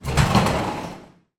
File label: industrial freezer walk in door slide open; sound effects one